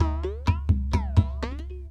PERC LOOP ETHNO 125; eJay

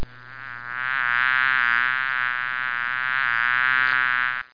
bug sound; How Many Bugs in a Box

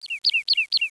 BIRD; CLOSE COMBAT

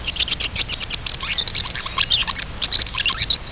budgie; Epic Interactive Encyclopedia

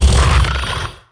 sobekite hit; DAoC; Toa; Gamestar

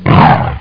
Grunt; Jackson's Alert Sounds

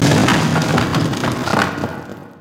boiler ceiling; Hellboy

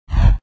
Fire Explose; mystic training

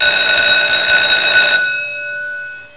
fire

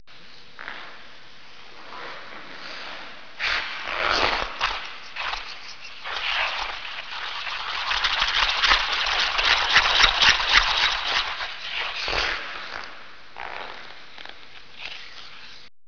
skate

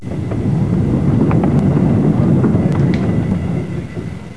SAT JET

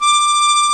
harmonica; Sound Effects for Multimedia - Volume 1; wind instruments

